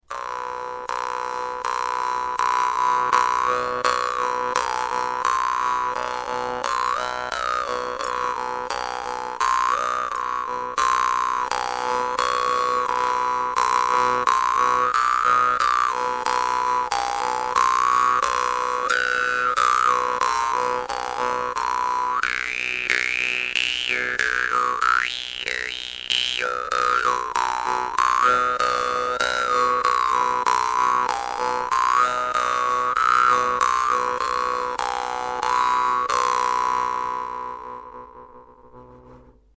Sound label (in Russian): vargan; 51area; doromb 51aria